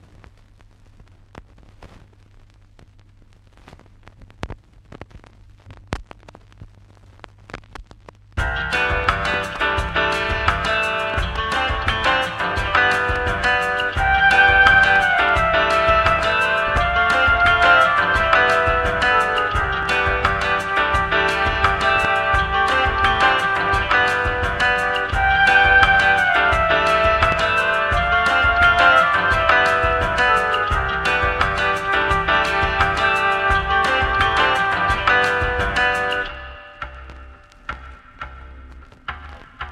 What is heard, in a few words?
bossa; springyspringo